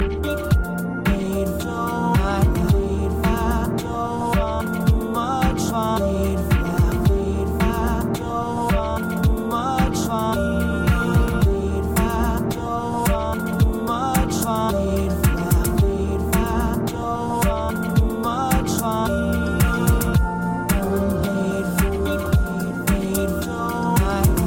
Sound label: Sick Japan Beat